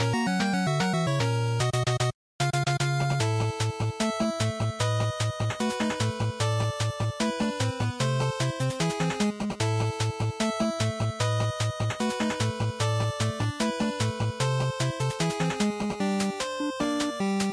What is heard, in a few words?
Shooter Stage Loop, bgm